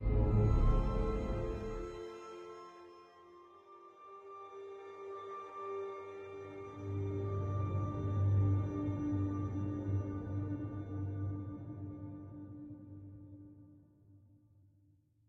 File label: All Players Dead; lethal company; ambience; windows